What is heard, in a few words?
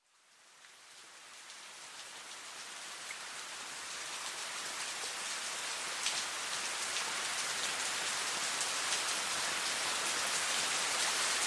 Relaxing Nature Sounds Soothing Rain no music